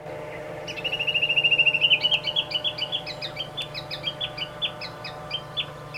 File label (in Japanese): uguisu; ウグイス雄; 工地の空き地にて; このコは声量のあるいい声出してました; 6秒間